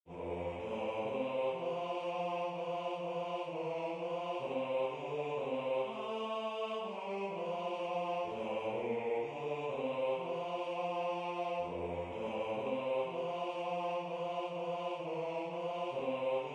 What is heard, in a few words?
Bass